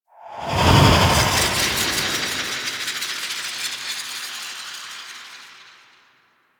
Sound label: Stalker Teleport In; Cinematics; Warframe; Orbiter Cradle